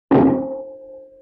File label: Wood Hit Mic In Bucket; Vintage Cartoon